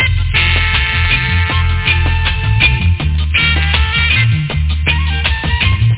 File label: loop; da capo vol1